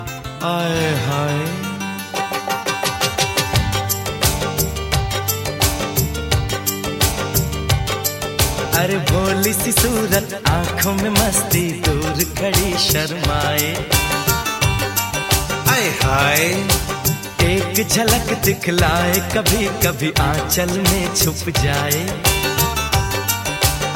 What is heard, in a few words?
Hindi Ringtone